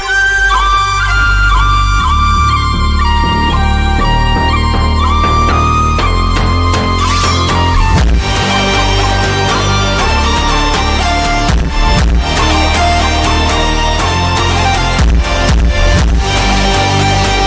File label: PHONK; ringtone